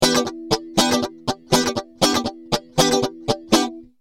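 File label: rhythm guitar dry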